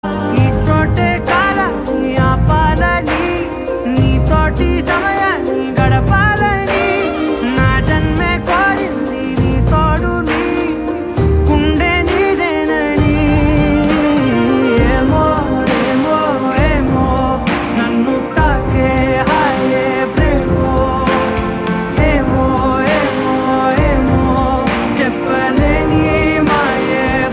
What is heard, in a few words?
Love BGM Ringtone